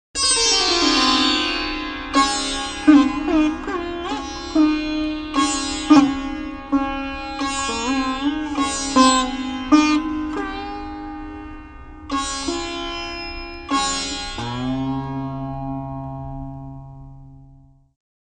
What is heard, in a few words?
sitar